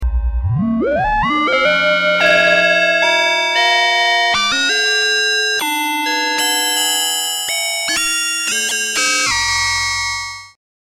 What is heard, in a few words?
prophet 600